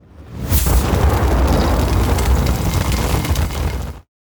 fuego brotando

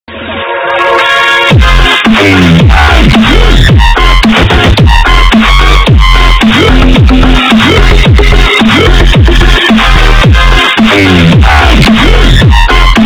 musica fresca

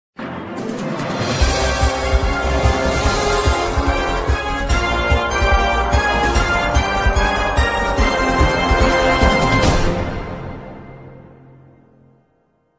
sonido de pregunta

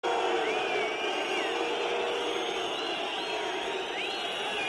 silbidos

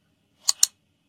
click sonido

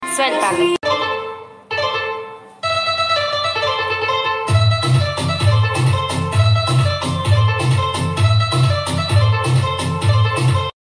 guaracha

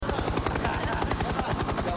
helicoptero

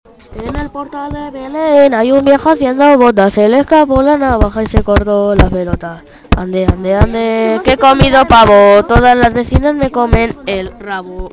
en el portal de belen

